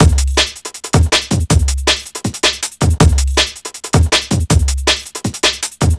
hat trick, Drum Bass Drumloops 160bpm; Zero-G; Total Drum Bass

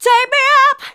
Female vocals and robo-vox

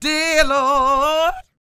SING, Vocal Planet Blues Soul BLUESY

